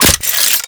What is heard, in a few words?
Revolver Rifle Mech, Weapons; Warframe; Tenno; Mod Quest